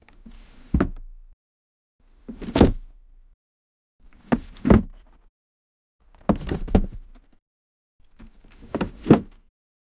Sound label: big wooden box being open